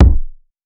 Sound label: SOUP LANE KICK